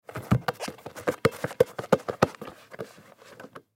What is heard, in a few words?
Basketball Up Pick Up Roll Up Leg; SPORTS; SoundEffects-Blastwave